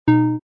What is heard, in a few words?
miss; rapid-fire